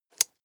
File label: nylon leather click